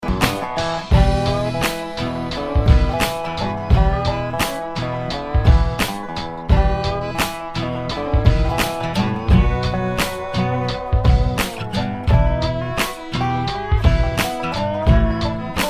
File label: justified instrument, ringtone